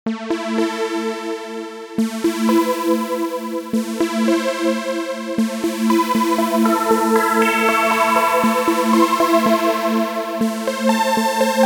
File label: hohner hs-2 e sounds 4x2op phase distortion